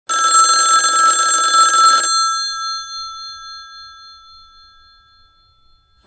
Ring Tones supplied with the 8180 SIP Audio Alerter; Algo; Bellen